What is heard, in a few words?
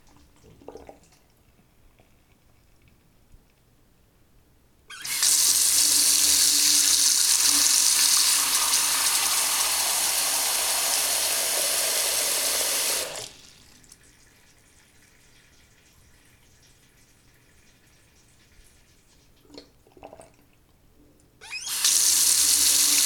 Bathroom Faucet